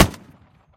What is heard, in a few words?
last shot, guns tanks; War Thunder